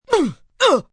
Grunt Kid Multi, Grunt, groan, impact, two hits, pain, hurt (human voice); male; Groaning; VoiceBox